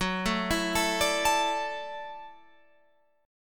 F sharp Minor 7th Sharp 5th, Guitar, Open F; arpeggiated; 18,16,16,16,17,16

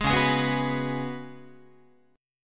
C sharp 7th Suspended 4th C sharp, Guitar; C#7sus4; strummed; tuning=4A,4E,5A,5D,5G#,6C#; guitar chord

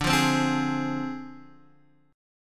D Minor 7th Flat 5th Guitar Open D

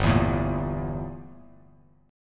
G Minor 6th Add 9th G down Bass Standard; Gm6add9 strummed; JGuitar

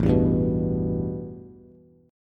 A 7th Suspended 2nd Bass; A7sus2 strummed

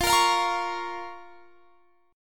F Suspended 4th Sharp 5th F; Fsus4#5; strummed; x,20,20,22,23,21

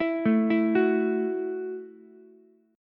Gb Minor 7th Gb down Ukulele; Gbm7 arpeggiated; JGuitar

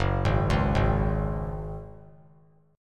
F sharp Suspended 2nd; arpeggiated; JGuitar

